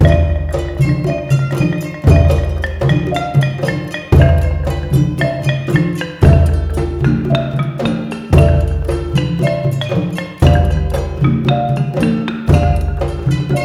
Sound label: MALAY R, Heart of Asia